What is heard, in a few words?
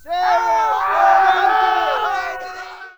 retreat; TOAW